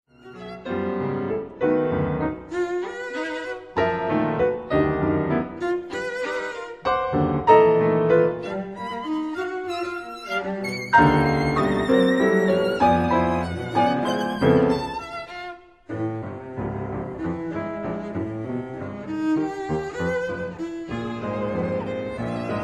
Trio for violin cello piano Molto marcato molto allegro; Helps